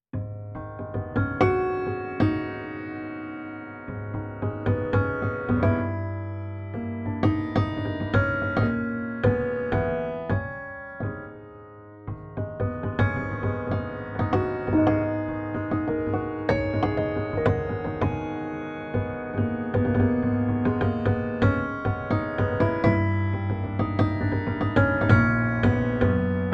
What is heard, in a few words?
petrof mistral improv hammers isolated